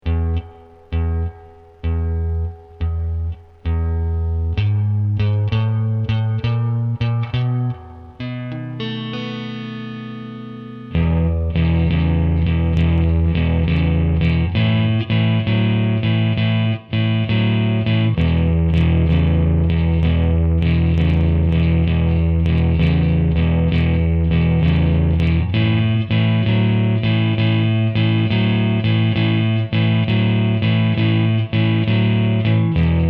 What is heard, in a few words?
new beginnings complete rhythm